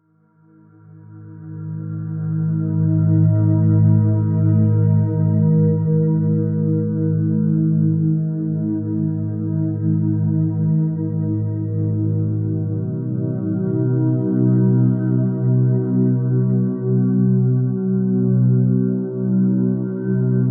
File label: 852 Hz